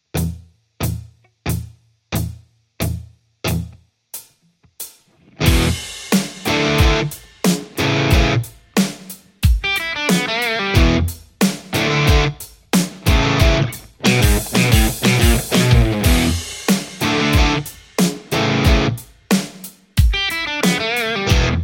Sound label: strat hybrit; poulin